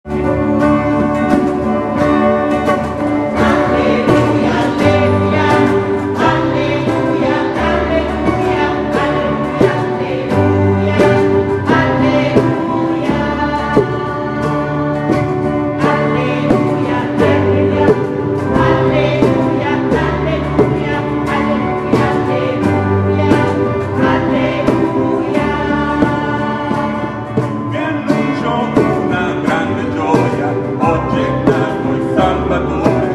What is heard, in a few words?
Alleluia, schola cantorum; vi annuncio